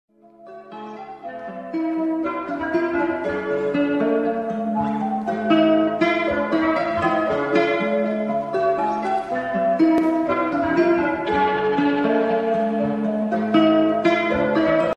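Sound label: no song only tone slowed reverb bgm ringtone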